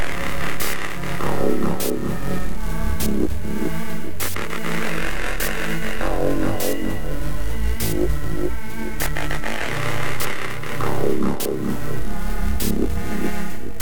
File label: growl, dogsynth; linglin art